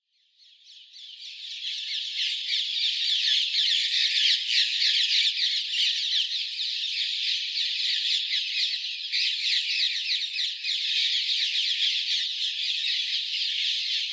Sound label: Rose ringed Parakeet Psittacula krameri